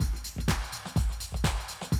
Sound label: 125, Classic Drum Loops